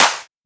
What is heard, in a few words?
Clap; FLPRO